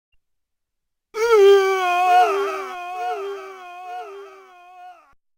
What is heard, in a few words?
death sound; street fighter